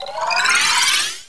Teleport; BOOM

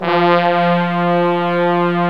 brass; Timidity; hit brass

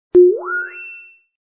lap; race

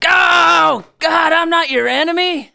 get shot; Messiah

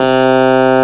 clav C2; Flashback

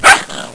yap; Sigoshi

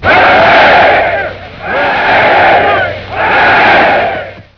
Pirate Cheer; WARLOCKSNDS

